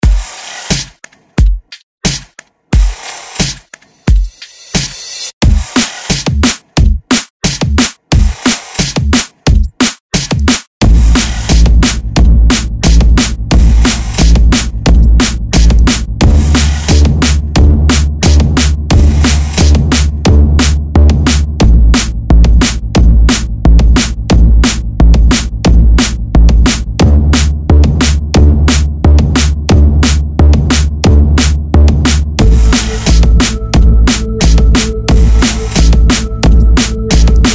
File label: Formantic noise